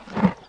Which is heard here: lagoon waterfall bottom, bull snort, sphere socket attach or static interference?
bull snort